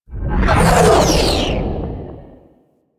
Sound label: Sentient Ship Close Short Fly By; Warframe; Enemy Ships; Space Fighter Sentient Coil Agent